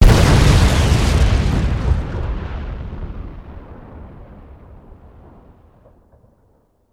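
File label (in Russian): взрыв бомбы с вырывающимся пламенем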